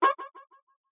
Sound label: switching weapons; mystical ninja starring goemon